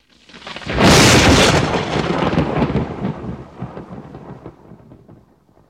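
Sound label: Thunder Crack Impact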